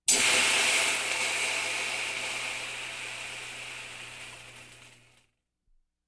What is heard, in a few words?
Sizzle heavy; Technology